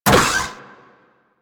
Door Open; Warframe; Ambience

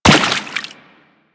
Run Water, footsteps; Warframe; Teshin

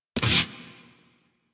Revenant Shotgun Alt Mech, Weapons; Warframe; Tenno

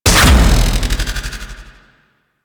Short Bow Charged Fire; Warframe; Duviri; Dax; weapons

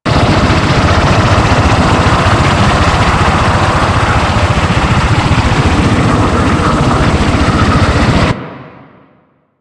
In Flight Loop; Warframe; Liset; Hub

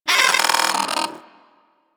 Hyena Micro Vocal Pain; Warframe

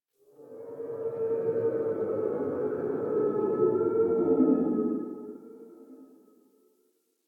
Exterior Distant Calls Happy Calm, Ambience; Warframe; Duviri

